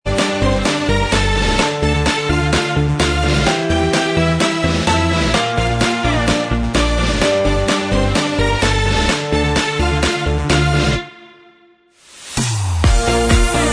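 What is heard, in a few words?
Instrumental Track, backing tracks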